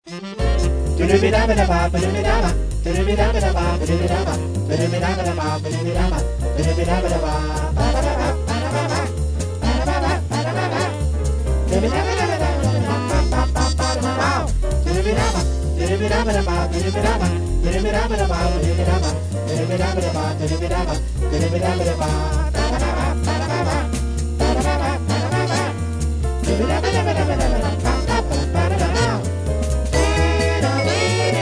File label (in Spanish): pianino bobcombo xg con voces